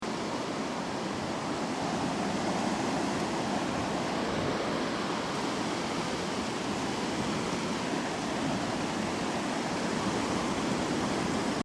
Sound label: Ocean Sound Of The Sea With Diffuse Steps; Nature; Crafted Signals